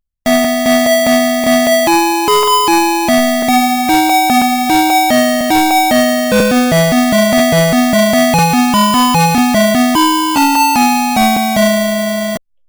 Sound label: Ten Little Indian boy